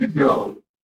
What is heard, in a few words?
ui low battery